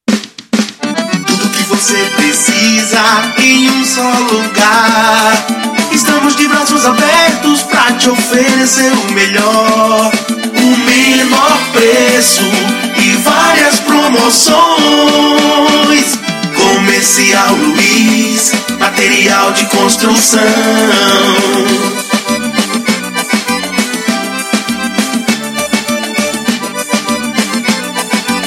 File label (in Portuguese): Comercial, jingles comerciais